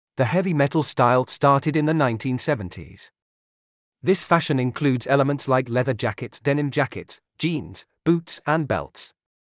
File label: heavy metal